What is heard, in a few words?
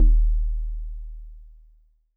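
Warble Celeste DX100 D#0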